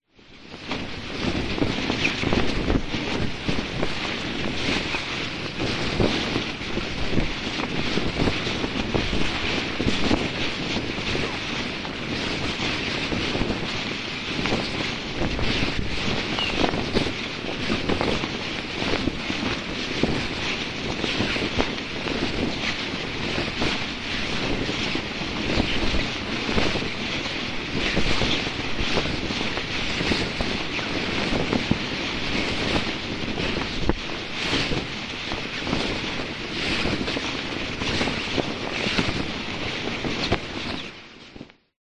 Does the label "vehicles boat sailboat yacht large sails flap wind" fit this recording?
Yes